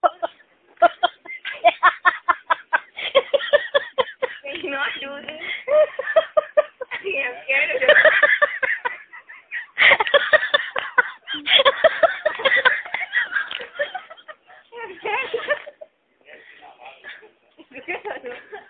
uncontrollable laughing, male; MALE MISC and SPOKEN WORD

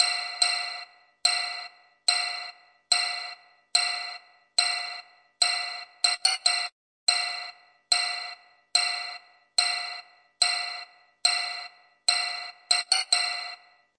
137 offbeats, drum loops; 131 to 140 bpm

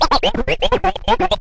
orange, Scratching and Turntablism; DRUM LOOPS and BREAKS

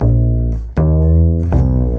contrebasse Loop

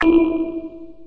checkpoint hit

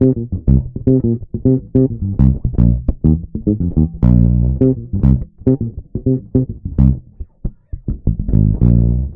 105 BPM electric bass funky semislap

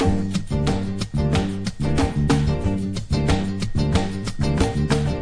092 brazil clappin groove; SAMPLED MUSIC LOOPS

